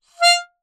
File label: Melodica Short Note